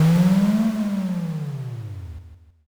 dragon

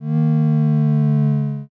ship horn

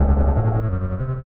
golem wake

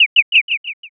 sparrow

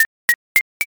nail

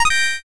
progress done

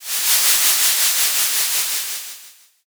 torch

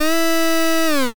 air horn

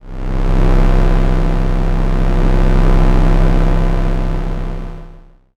space engine

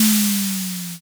shoji

